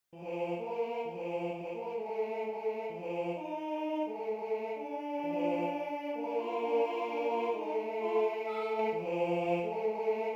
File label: Tenor II; Der Pudele Luis